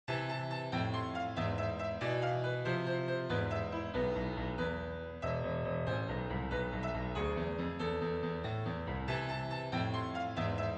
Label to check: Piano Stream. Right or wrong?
Right